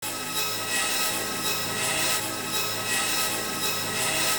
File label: build up loop